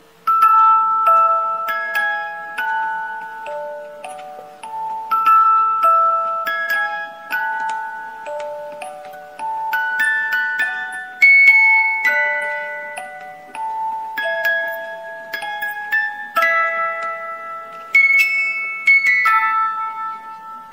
18 lames, boite musique; manivelles

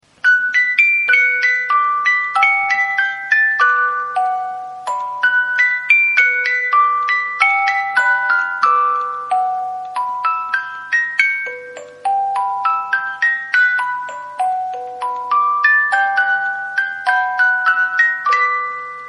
18 lames, boite musique manivelles

